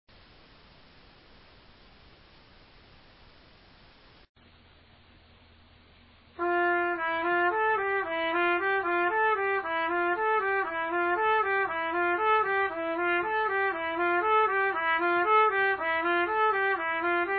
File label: waltz